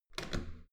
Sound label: metal door heavy handle down